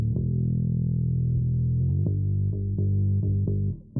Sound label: bass; musdb18